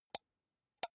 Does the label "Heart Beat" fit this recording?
No